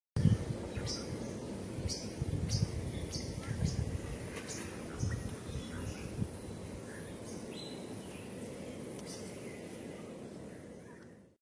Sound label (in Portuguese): canto do tecelao de speke